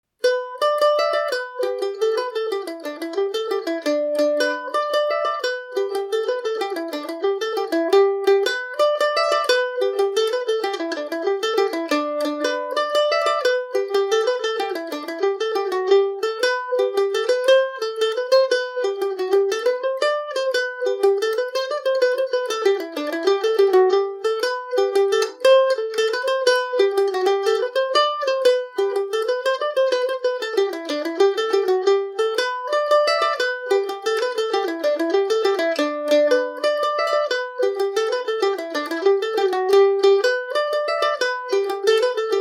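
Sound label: jig, G major, irish mandolin; Adam And Eve (Jig); Kentucky KM1000 mandolin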